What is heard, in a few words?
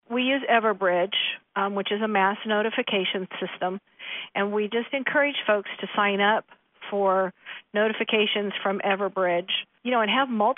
Sirens